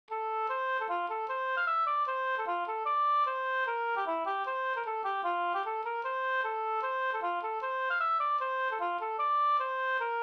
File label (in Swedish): schottis; Bjornfrossa fr Grycken av Goran Hellgren Sater